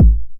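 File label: 909 BD tape, kick drum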